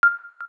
KEY XYLOPHONE 9, keytone

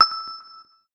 Perl Drop, notifications; HTC One M9

